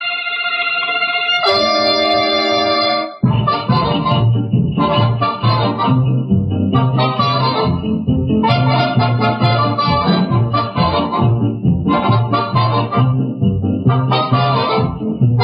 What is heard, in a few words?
Orchestra; Jingle Bells; 1931